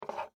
Object Pick Up, Foley, Object, Pick, Up, From, Table; Pick Ups